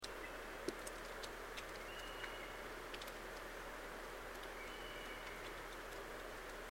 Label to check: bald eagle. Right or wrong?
Right